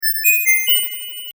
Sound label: jingle; ユウラボ8bitサウンド工房; 謎を解いた時とかにも